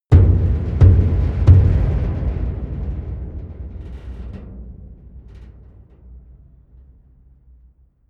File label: big metal impact hard punch